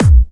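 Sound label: BD Clubby, bass drum; VEC1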